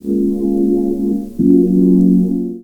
Keys; Jungle; Riffs, Arps & Hits; Blu Mar Ten - Jungle Jungle - 1989 to 1999 Samplepack

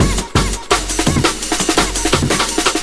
Massive Amen; Jungle; Breaks; Blu Mar Ten - Jungle Jungle - 1989 to 1999 Samplepack

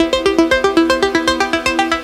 Arp - 9A, Jungle; Riffs, Arps & Hits; Blu Mar Ten; Jungle - 1989 to 1999

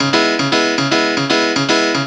Piano; Blu Mar Ten; Jungle Jungle - 1989 to 1999 Samplepack

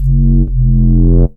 Bass - 4A, Jungle; Blu Mar Ten; Jungle - 1989 to 1999

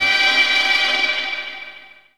Hit; Blu Mar Ten; Jungle Jungle - 1989 to 1999 Samplepack

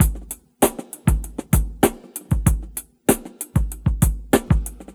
Breakbeats

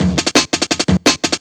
Reach Break; Jungle; Breaks; Blu Mar Ten - Jungle Jungle - 1989 to 1999 Samplepack

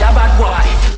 Bad Boy, Vocals; Jungle; Blu Mar Ten - Jungle Jungle - 1989 to 1999 Samplepack

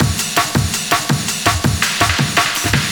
Amen; Jungle; Breaks; Blu Mar Ten - Jungle Jungle - 1989 to 1999 Samplepack

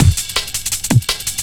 Sky Break, Breaks, Jungle; Blu Mar Ten - Jungle Jungle - 1989 to 1999 Samplepack

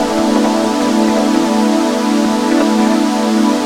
Pad, Jungle; Blu Mar Ten; Jungle Jungle - 1989 to 1999 Samplepack

